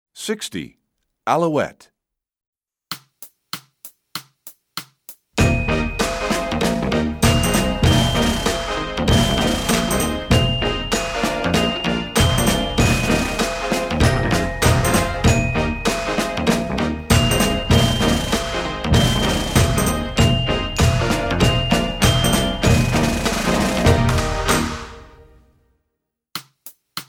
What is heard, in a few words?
Percussion; Alouette; EE2000 Book1